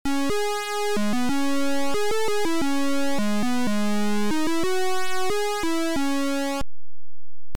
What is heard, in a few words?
Title, Beeper; Fire Lord